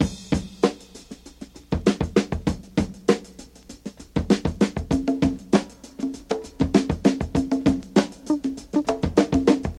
98 bpm high quality breakbeat f sharp key, kick tuned to the F# note